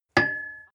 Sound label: metal impact pole high medium; hits explosionen; metall